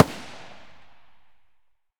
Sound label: ak74u muzzle mono far, weapons; STALKER; IWP